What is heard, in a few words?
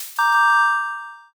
reveal